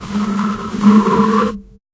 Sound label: heavy short swish with, friction